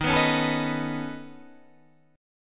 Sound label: Db 7th Suspended 2nd Sharp 5th Eb, chord shape